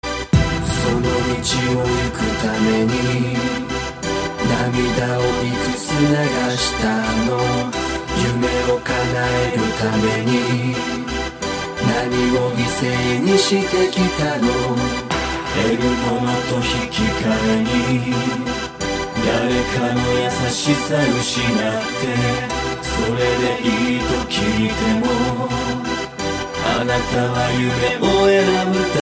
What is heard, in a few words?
ringtone